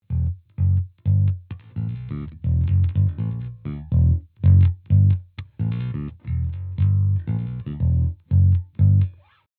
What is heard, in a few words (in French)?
doigts, bass roadworn; fender bass roadworn